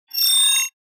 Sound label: Futuristic transition